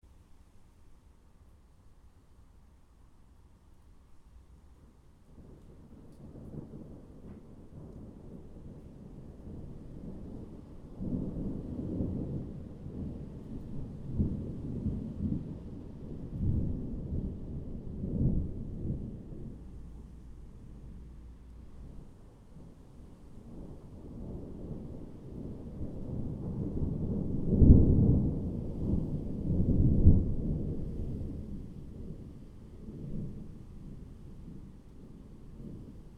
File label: background thunder no rain; ott3d